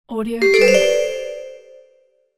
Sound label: notification